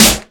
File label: good steel snare drum A key, snare tuned to the A note